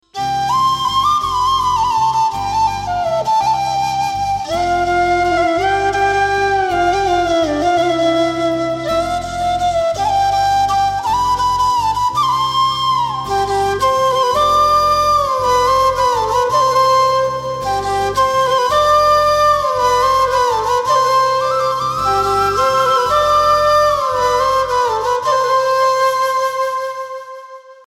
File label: Tune flute Mix; University Ringtone